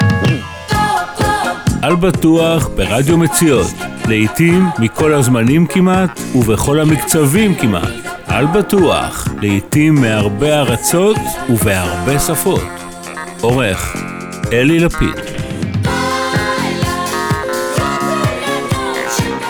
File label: Jingle